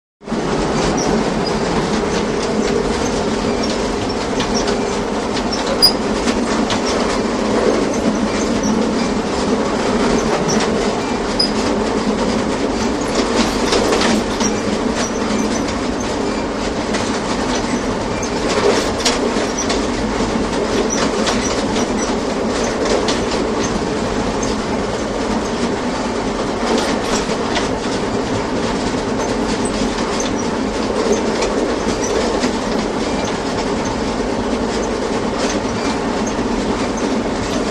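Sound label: Diesel Train Interior Steady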